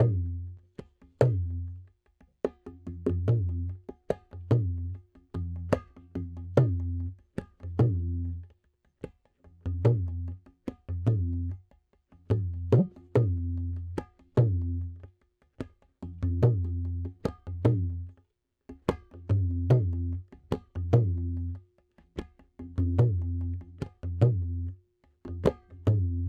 tabla B 073, Hip Hop India; Hip Hop India Modern Percussion; Apple Loops

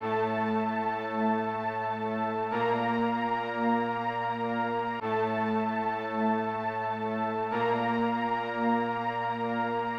Strings B, Hip Hop Exotica, 096 Amin

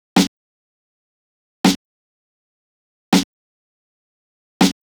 Snare; Hip Hop Exotica; big fish audio; Apple Loops; Drum Tracks

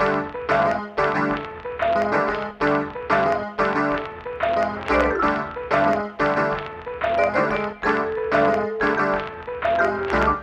Combo, Hip Hop, 092, Dmin

